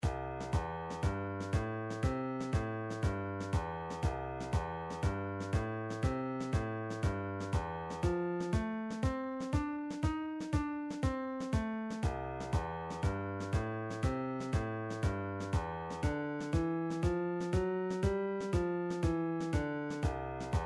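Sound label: midi drums shuffle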